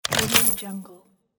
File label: open money